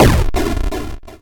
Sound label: Get hit; Beat da Beat